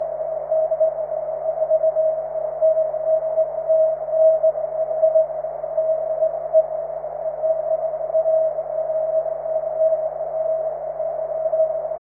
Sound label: cw; 23cm